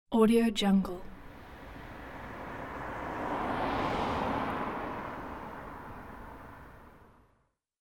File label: bike pass by; urban sounds